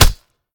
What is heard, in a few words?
hit; smite; god tiamat